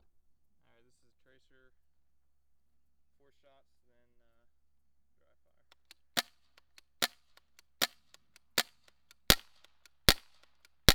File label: trracer, guns; trracer raw no clip